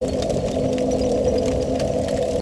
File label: Bornfire